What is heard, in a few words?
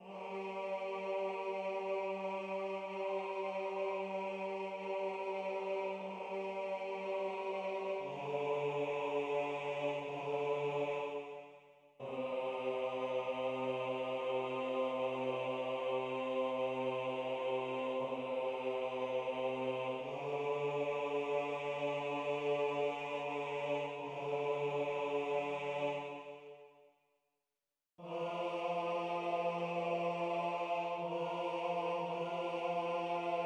Bass